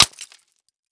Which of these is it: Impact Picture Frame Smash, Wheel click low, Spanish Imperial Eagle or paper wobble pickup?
Impact Picture Frame Smash